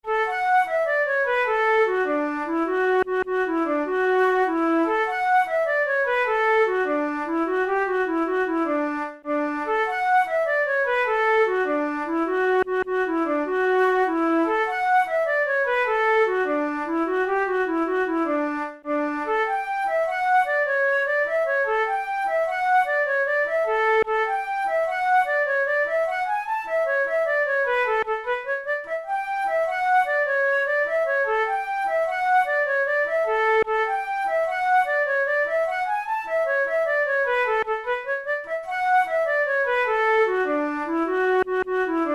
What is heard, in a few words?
reel, flute